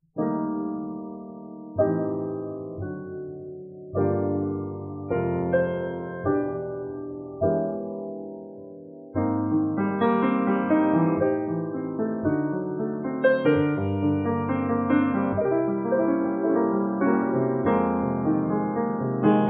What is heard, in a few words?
solopiano; Pianistin